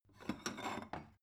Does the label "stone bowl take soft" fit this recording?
Yes